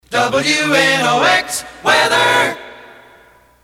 Weather A Capella; WNOX; PAMS

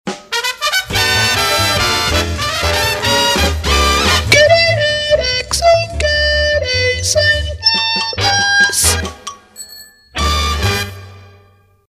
Antique Horn Sonovox; PAMS Numbered Series; KXOK

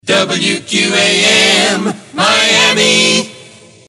Moog; WQAM; Gwinsound

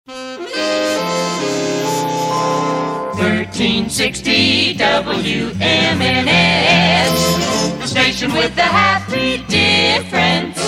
Theme and Logo Saxes

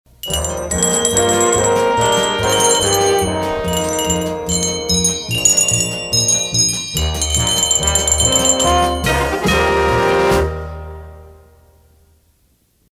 Bell Sig; PAMS; KEZY

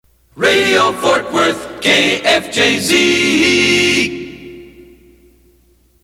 Full Sig A Capella; KFJZ; PAMS

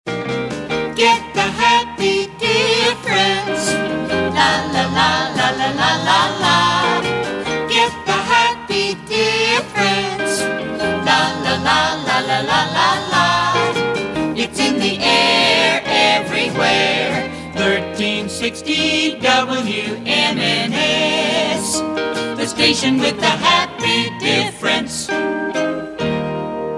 Happy Difference

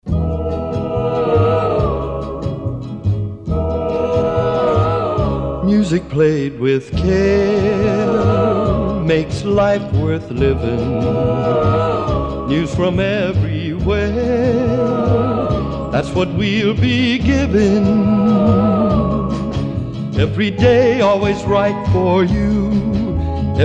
Music Played With Care; WQAM; JingleMill; jingle packages

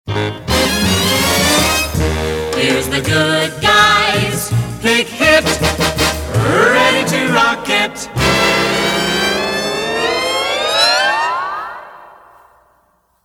Pick Hit; PAMS Numbered Series; KOMA

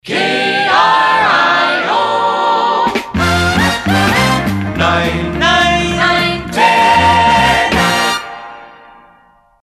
Slow Impression; KRIO; PAMS

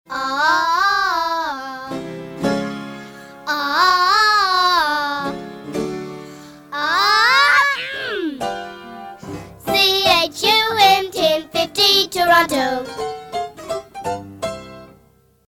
Vocal Exercise; PAMS; CHUM

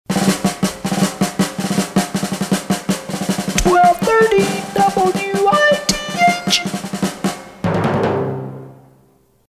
Fife and Drums Sonovox; PAMS Numbered Series; WITH

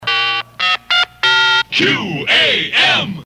Tones; WQAM

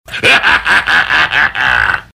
Laugh; WQAM

